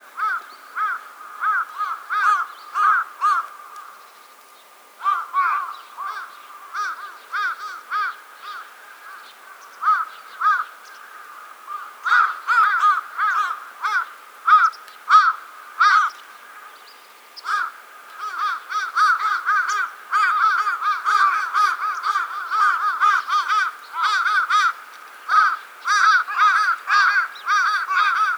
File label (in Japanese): karasu